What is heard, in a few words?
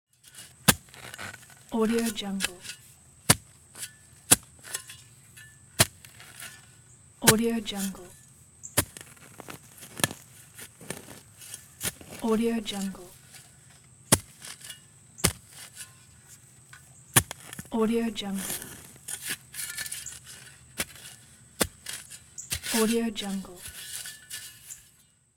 shovel chopping digging